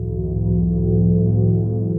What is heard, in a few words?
Resonant Cymbals, dark textures; Instruments; Ableton; Mood Reel